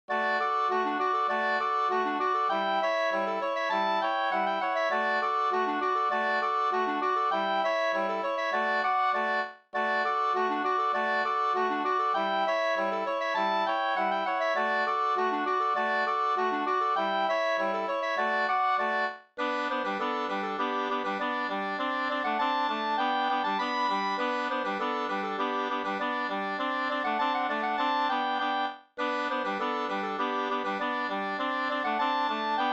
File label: polka; Koto-Fias polka; Terjarv fi